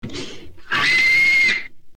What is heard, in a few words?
chubby pig scream